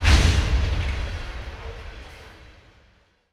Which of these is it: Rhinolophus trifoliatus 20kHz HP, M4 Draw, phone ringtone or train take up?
train take up